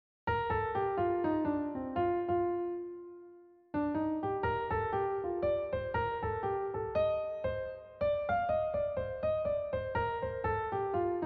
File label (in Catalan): Dictat jazz